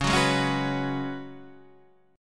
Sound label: C sharp 7th Suspended 4th C sharp; C#7sus4; strummed